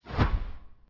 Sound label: Pirate Birdnest